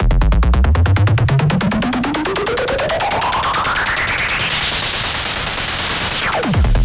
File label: Sweeps Up, Effects; Vengeance; Essential Clubsounds Vol.4